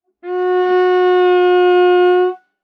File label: Viola arco ff sul C Gb4 stereo; MIS Pitches - 2014; Strings